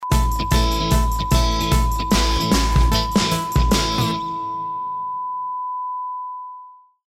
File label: Jingle